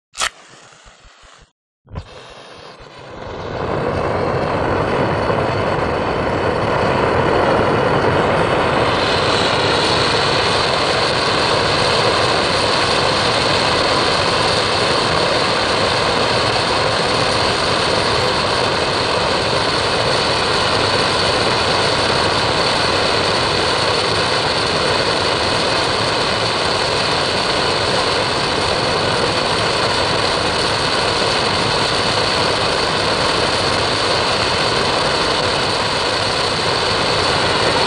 Torch; Gas Blow; Gas Blow Torch Lit And Constant. Heavy Roar Which Quiet's Down Near End Of Track.